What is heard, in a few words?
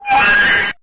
creature scream; tbh